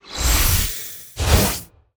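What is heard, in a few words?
Summon Card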